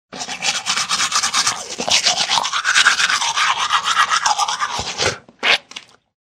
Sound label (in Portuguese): escovando os dentes